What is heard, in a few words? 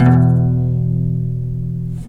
insect, Guitar; Notes